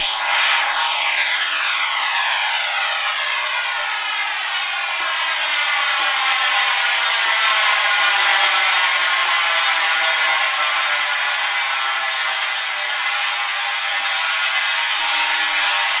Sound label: Soundscape; FX